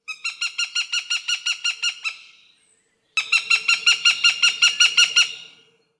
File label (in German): Habicht Accipiter gentillis; Vogelstimmen